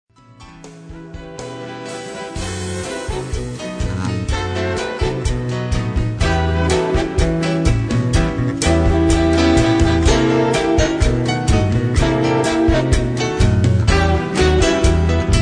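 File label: instrumental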